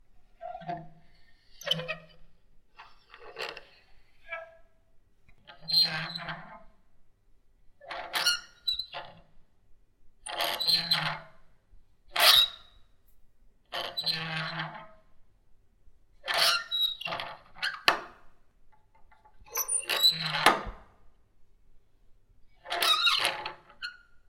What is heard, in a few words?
rusty clasp